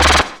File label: Synths C; Vengeance Samples